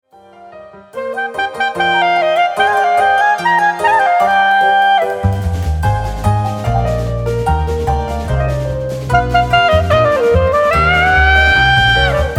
KLEZMER FEELINGS

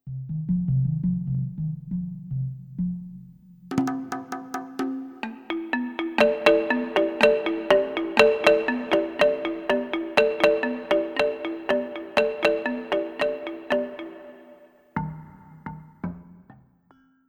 Village; Dorf

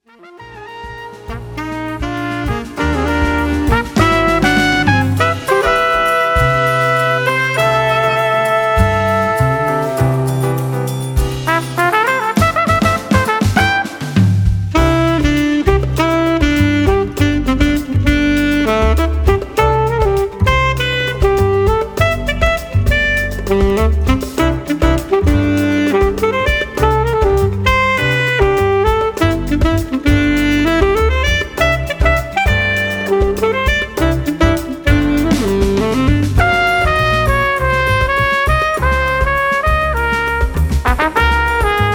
CARIBBEAN STREET DANCE

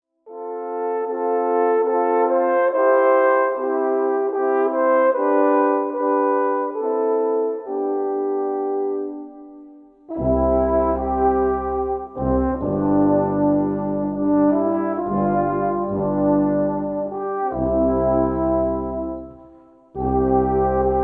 Jodler Solo Blech Brass version

